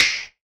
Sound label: SNAP; Best Service; Dance Mega Drums; SNAPS